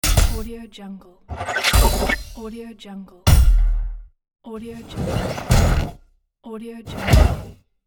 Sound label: stamp transition